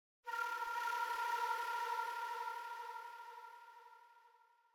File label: Flute Tone - Fast Pulsating Tremolo; Hollywood Edge; sound designer's toolkit v2